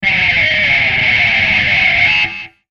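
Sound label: Music Guitar Pick Scrape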